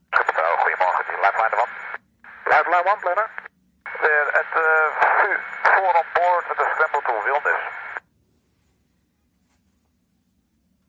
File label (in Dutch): scramble, Traumaheli; wilnis; lifeliner1 wilnis amstelkade; Traumaheli naar de Amstelkade in Wilnis voor gewonde fietser(audio); Een fietser is zondagmorgen gewond geraakt op de Amste